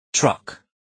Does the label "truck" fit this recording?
Yes